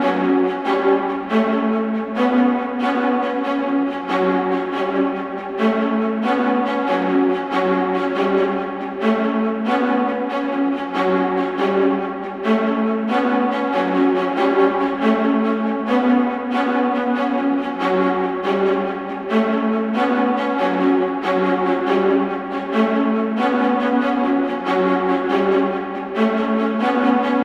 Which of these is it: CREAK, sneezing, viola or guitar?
viola